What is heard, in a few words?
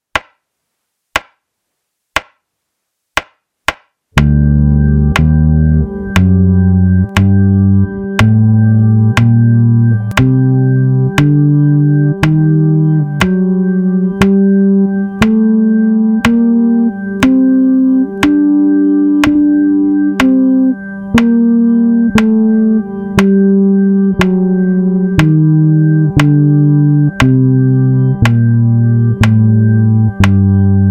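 Phrygian Dominant, bass; a bassists guide to modes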